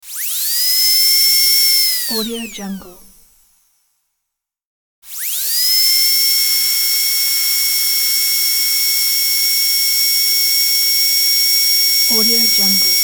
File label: dentist drill spinning